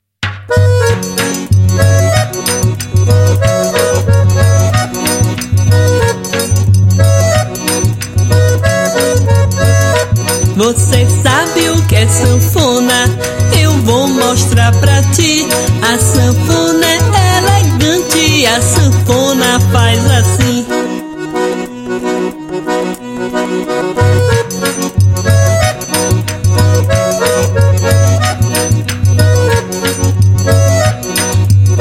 os instrumentos do forro

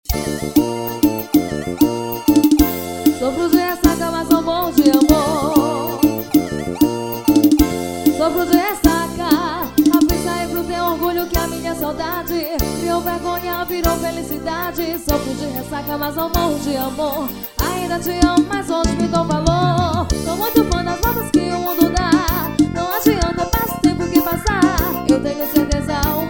forró

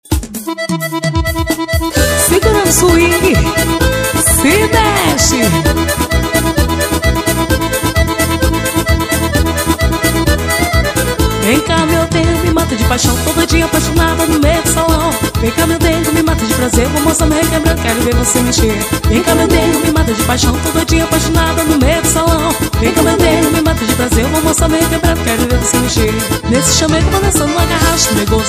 forró

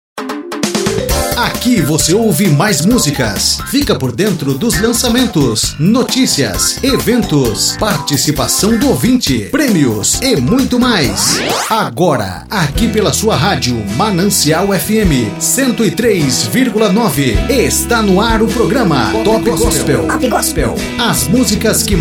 vinheta programa gospel; topgospel

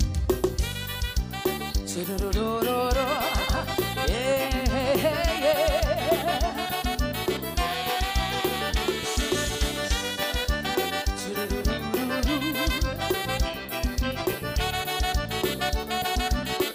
cantora

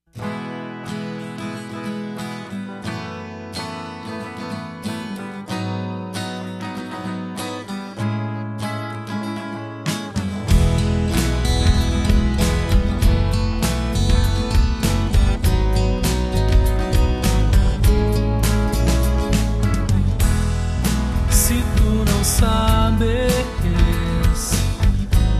bossanovissima

